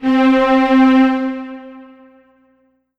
Korg N1R N Strings C4

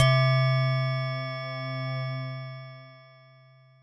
Roland JD-990 Wave Bell C5

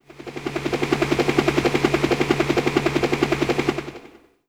Yamaha TG100 Helicopter

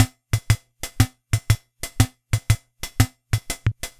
Yamaha PSR-22 Heavy Metal

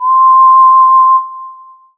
Roland SC-88 Whistle C6

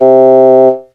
Yamaha PSS-280 Oboe C3

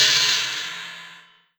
Yamaha TG100 Chinese Cymbal

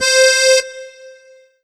Korg TR Rack Musette C5

